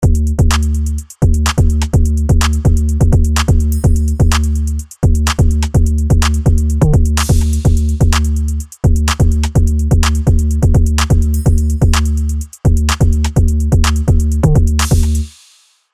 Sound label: Avenger Hiphop Classic